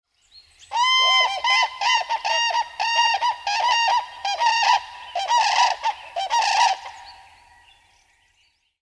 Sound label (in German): Kranich Brutrevier